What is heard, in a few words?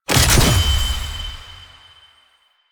UI Arcane Install; DE; Warframe; Mods